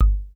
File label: KICK, Hip Hop